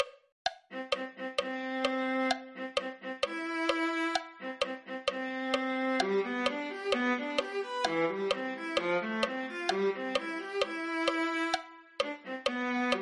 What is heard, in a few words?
viola